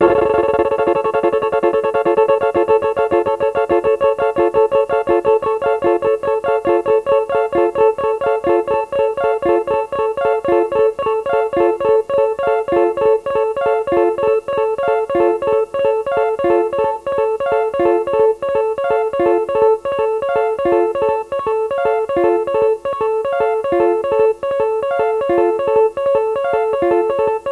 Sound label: phasing